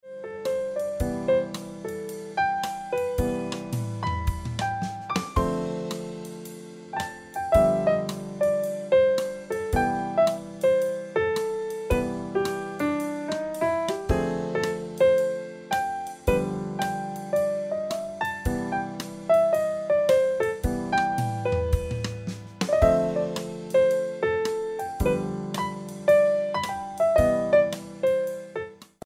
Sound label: Piano Music